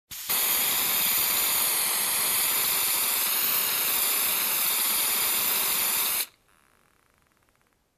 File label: Spray can sound